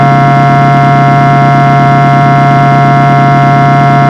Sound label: horn, sirens; federal sig rumbler